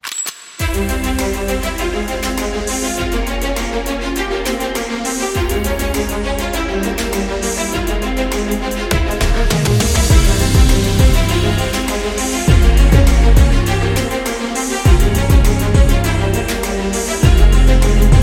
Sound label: Cinematic Hip Hop